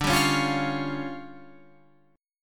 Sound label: D Minor Major 7th Double Flat 5th, D